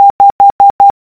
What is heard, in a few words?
5 number morse code